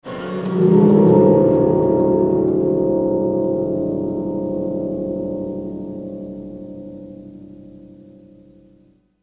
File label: Creepy Piano